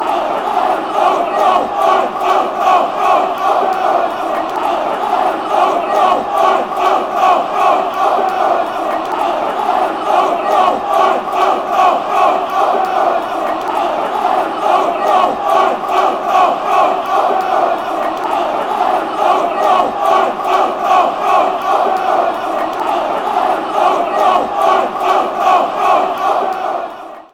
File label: off off off, chants